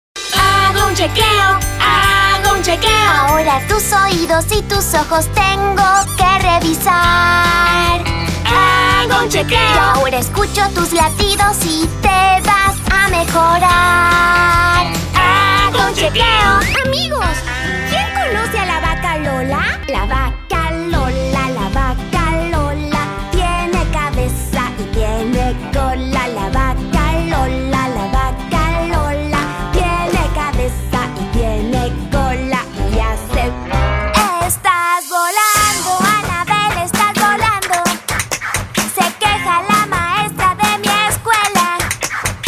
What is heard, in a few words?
Singing Jingles